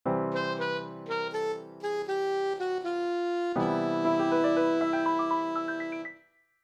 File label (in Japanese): 管楽器がいる時のエンディング